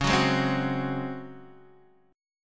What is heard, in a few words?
Db Major 7th Suspended 4th Db down Guitar Half Step Down